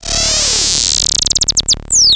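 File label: Monotron Squeaka; transition; Monotron Squeeka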